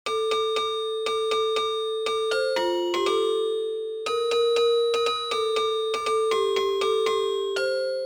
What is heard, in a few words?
jingle bells